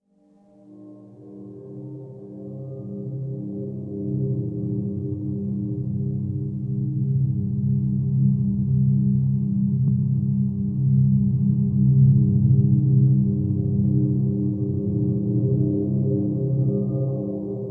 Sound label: waterphone c4; fx; PC Gamer IT CD 40; SMACDEMO